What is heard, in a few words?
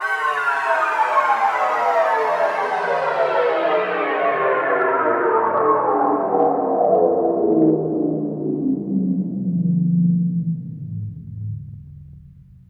Synth, Breakbeat